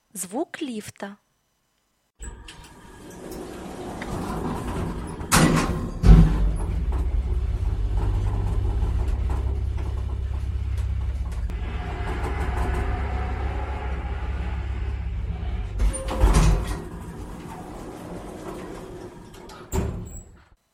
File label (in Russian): Звук лифта